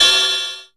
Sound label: hat, drum; Masterbits - Total Zone